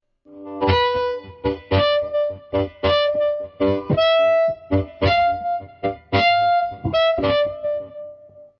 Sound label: Pull Slap Diato Slow, harmonica